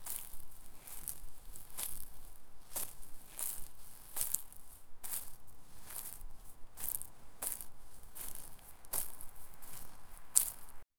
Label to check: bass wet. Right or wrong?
Wrong